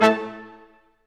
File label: BRS Quintet staccato, Symphony Orchestra; Roland LCDP08